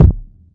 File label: kick, classic hip hop